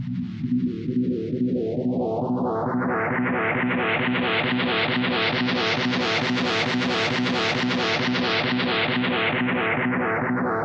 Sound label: 135 filtered guitar strums; SYNTH AND ELECTRONIC LOOPS